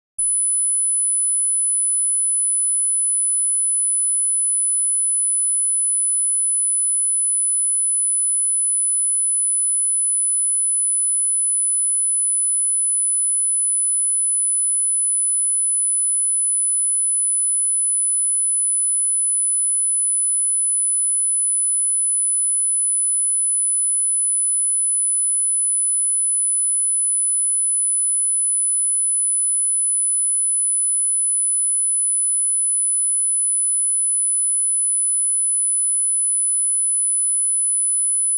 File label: 10000 Hz 10 dB Right